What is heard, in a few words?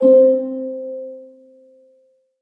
melody C4; fairymirror